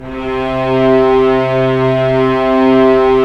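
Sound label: STR SC, strings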